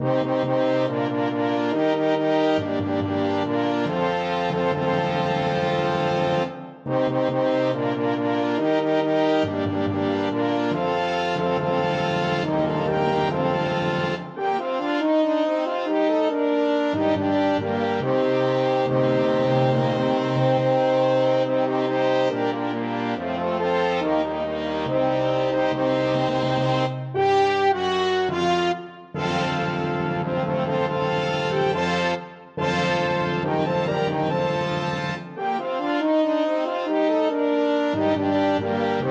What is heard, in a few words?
brass choir